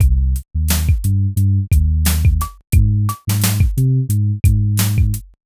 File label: boom bap beat bass groove 88bpm